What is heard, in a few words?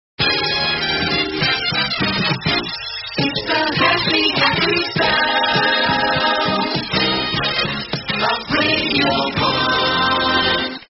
radio jingles the happy happy sound